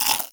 Block Delete; Ui Block Delete1

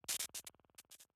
Boss Core Hurt Stinger; Avie

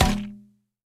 Weapon Metal

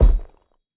Movement; Going Under

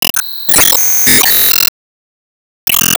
Sys Stage Round Complete

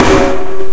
Snare intro; Guitar Rock Tour

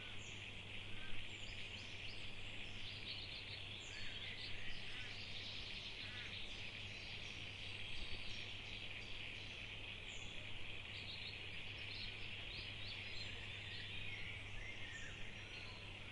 greenhouse Ambience; lethal company

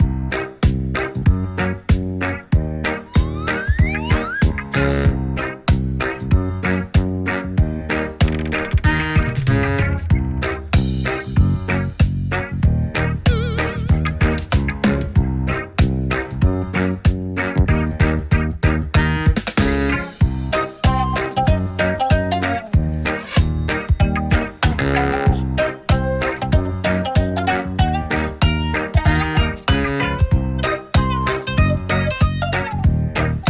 Instrumental; Cake Race Legacy

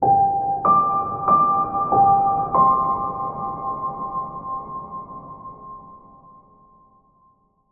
Puremelody 95bpm 44 G4 Phrase; Planet of Lana

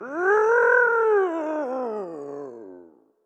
Death; Rabblerouser

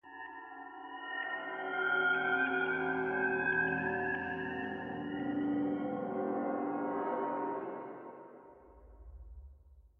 Church Jingle

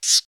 Leech

